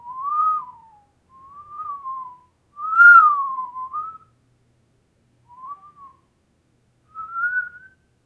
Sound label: human hooting and whistli